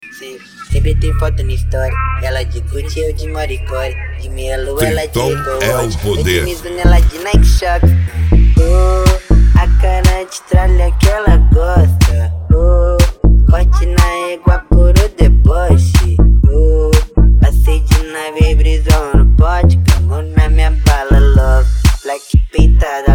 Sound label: mega funk